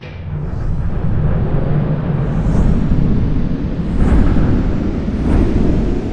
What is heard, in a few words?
fan on; CounterStrike; cstrike